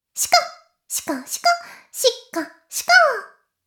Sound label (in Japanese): しこしこ音声; おにいちゃんアイスぅー！